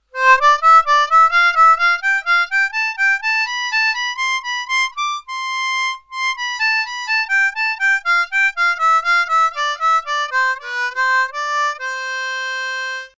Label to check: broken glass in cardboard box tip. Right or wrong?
Wrong